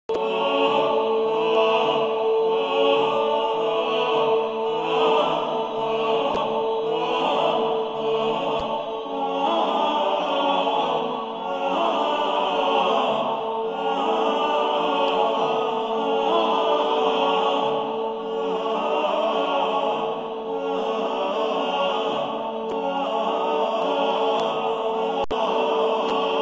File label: organum quadruplum; Perotinus; Viderunt omnes